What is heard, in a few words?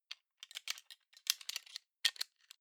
plastic frame small mechanics rattle